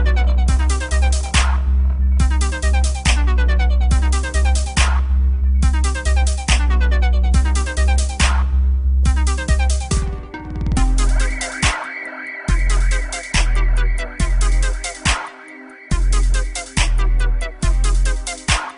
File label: Zumba Dubstep